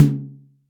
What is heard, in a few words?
small reverb tome drum sample c sharp key